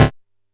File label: D2, Bass; E-MU Producer Series Vol. 6 – Analogia Project; Boom da Bass